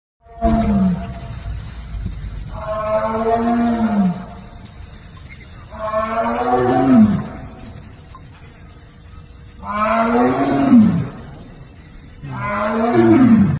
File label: Corbett Tiger